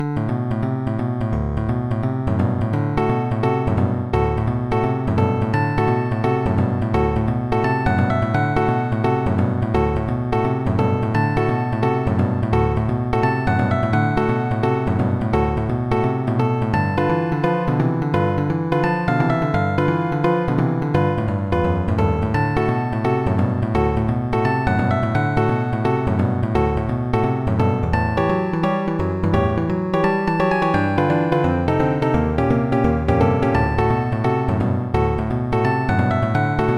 modem song; The Music Studio

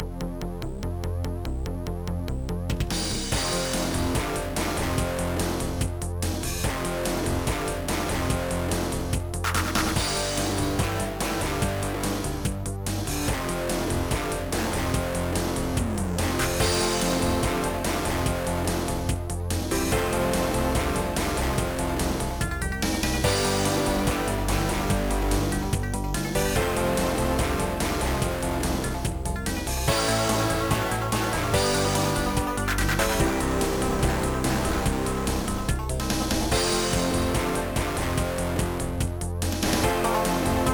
Hectic Banjo; MusicMaker V8

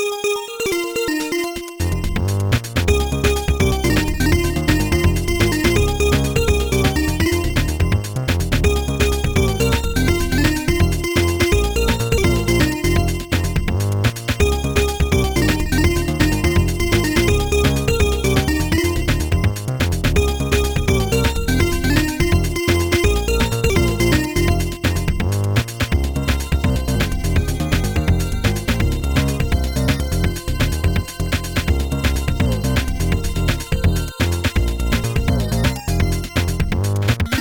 chiptune

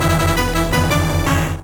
jingle; Space Gun

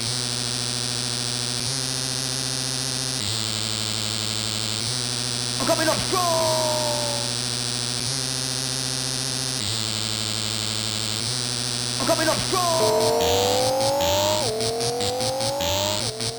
drive techno; Trackers; Modland

